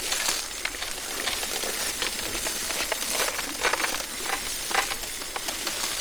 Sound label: pain loop enemy; LoL; trundle